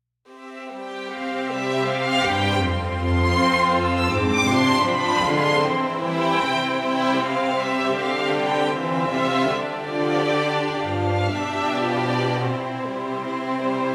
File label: VIENNA